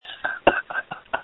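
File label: screams that feature laughter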